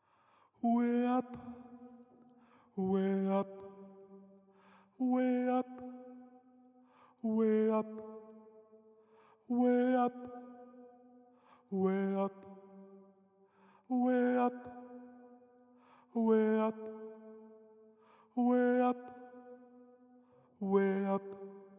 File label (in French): TENOR; le CD pour le Téléthon 2013